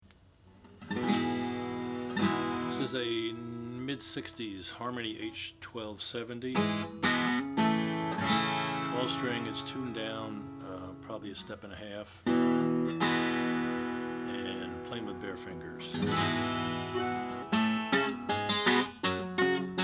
harmony h1270 natural

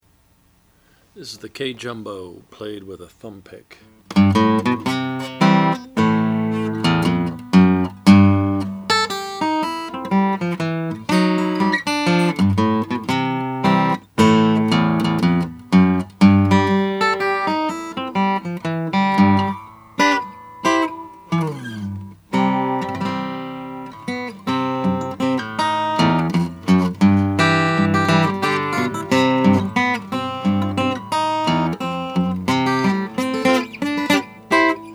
kay solo special jumbo natural

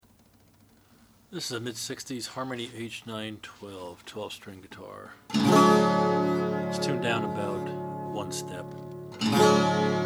harmony stella h912; 1966; sunburst

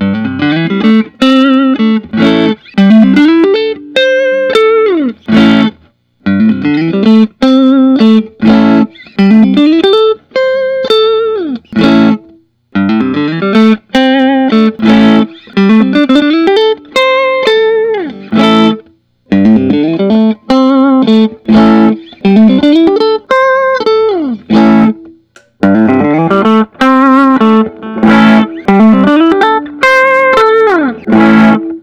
fender custom shop 61 hss relic; wildwood 10

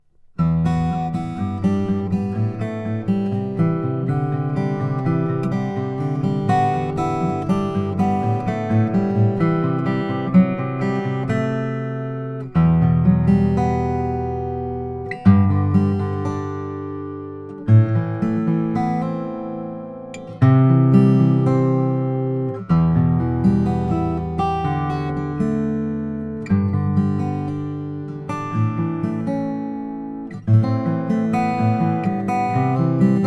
froggy bottom p12 std brazilian rosewood

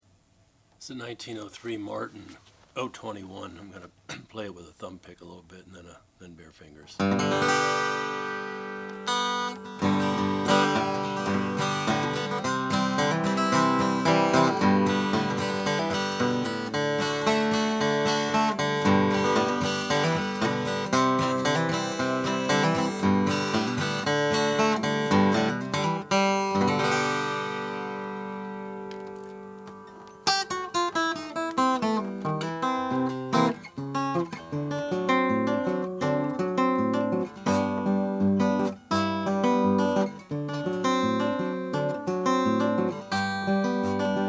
martin 0 21 natural

